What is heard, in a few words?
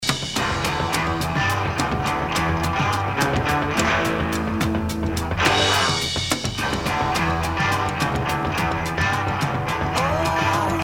Zepp Pedal; Super Sizzle Effect